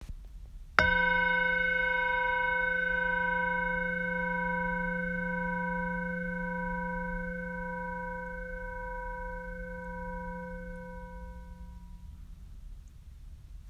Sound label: bell